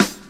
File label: snappy snare single hit g key, snare drum tuned to the G note